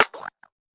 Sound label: tight hit; STYLE KITS (Hip Hop, Etc)